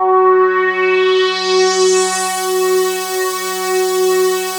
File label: F#3, PROPHET VS, Vintage Keyboards; Big Fish Audio - First Call Vintage Keyboards